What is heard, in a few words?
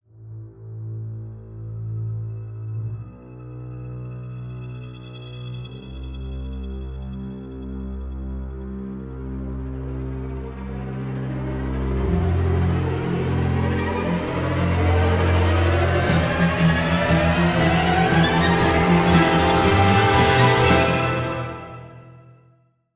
Music; Emergency 5